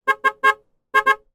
transport car horn beep x3 short followed by x2 short